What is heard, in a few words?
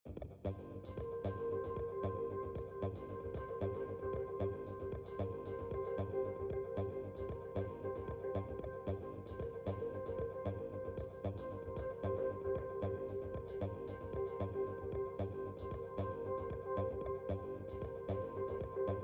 shadow dark